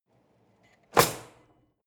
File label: drawer cutlery close draw short hard